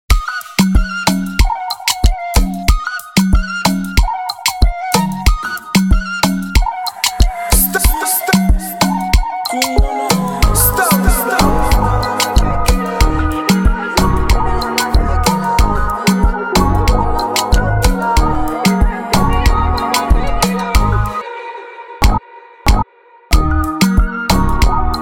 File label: beat afrobeat; GROOVER EMPIRE